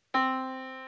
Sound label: Yamaha Disk, piano sound